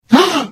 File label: Hit; The Elder Scrolls III - Morrowind